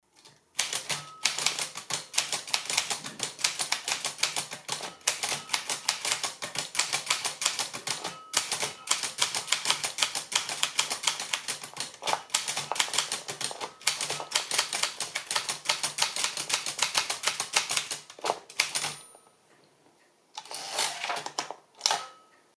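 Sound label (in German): optima plana, Schreibmaschine; Hörbeispiel Optima Modell Plana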